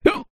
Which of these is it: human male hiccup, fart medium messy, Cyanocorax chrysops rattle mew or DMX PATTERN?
human male hiccup